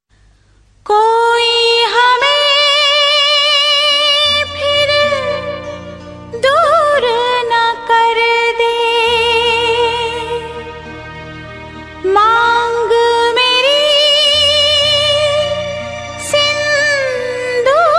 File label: female